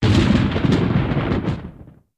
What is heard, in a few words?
Large Explosion